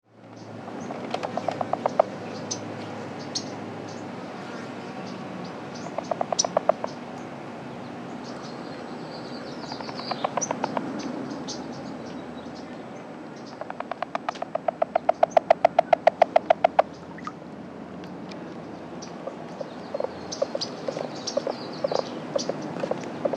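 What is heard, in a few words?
rluteiventris air underwater; This is a 26 second recording of a series of sounds made by two frogs. First, a frog calls in the air two times, then it dives underwater; sounds made during an encounter of two male frogs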